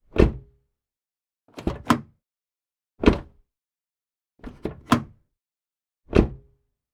Car Door Open Close Exterior Perspective

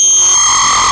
Glitch

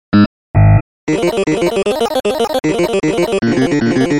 Buzzer Harpsichord Ish

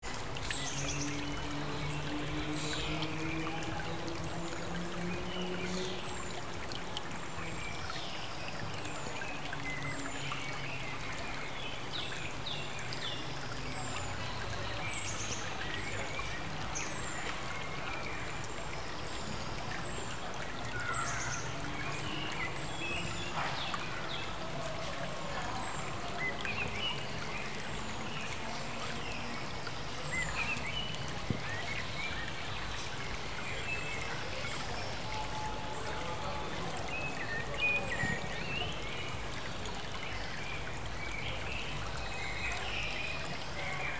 Nature Ambience Little Wood

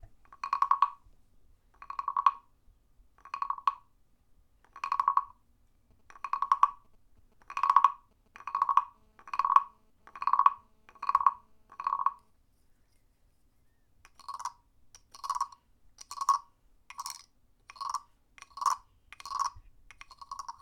Bull Frog

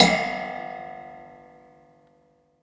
Spring

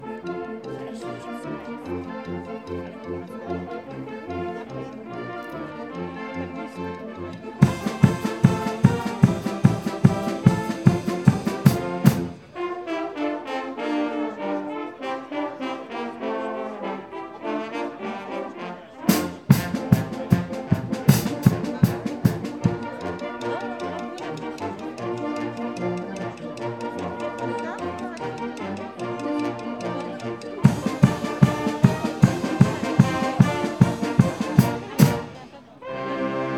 Silesian Brass Orchestra

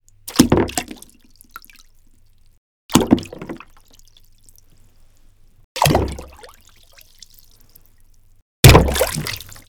Stone Falling Water

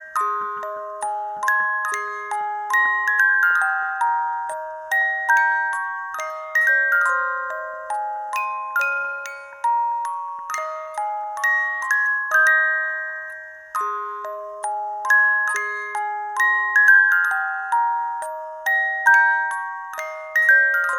Music Box

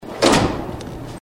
Metal Door

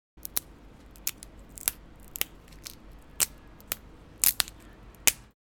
Twigs Snapping

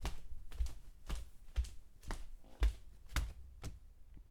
Male Walking Barefoot On Wood Floor

